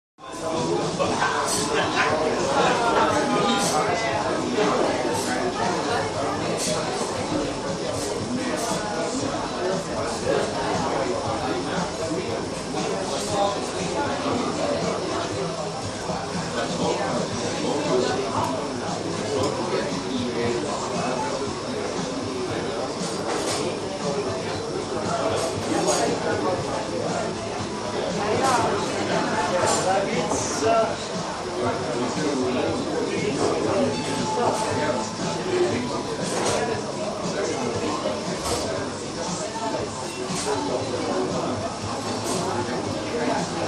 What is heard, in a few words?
Busy German Cafe Chat